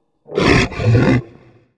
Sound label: Npc shark die; Hernshark; Fly For Insanity